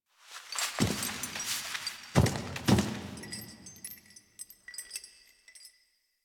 Movement B; The New War; Ballas; Cinematics

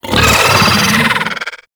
Pet Vocal Angry; Warframe; Alad V Pet

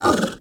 Kubrow Tame Interact Bark Speak

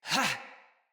Attack, Battle Barks; Envoy

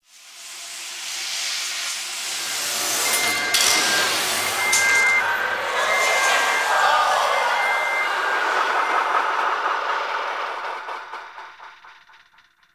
Ghost Cargo Echoes, Ambience; Warframe; The New War; Zariman

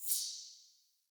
UI XP Tick

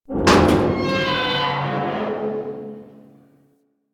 Dumpster Creak Groan A; Warframe; Ambience; NineteenNinetyNine

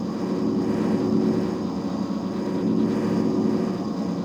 Wall Machine; Warframe; Corpus; Outpost Defense

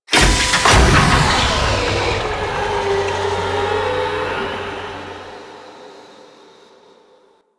Sabotage Reactor Pump Activate; Warframe; Missions

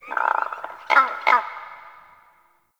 Critter Random; Warframe; Ambience; Nokko Colony

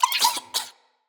Vocal Gross, Animals; Player; Vocals; Floppet Player

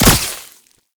Wall Climb Dodge End Point; Warframe; Oraxia

